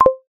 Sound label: multimedia pop up alert tone